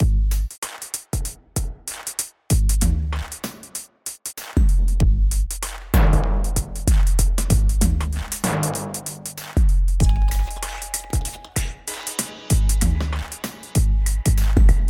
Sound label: String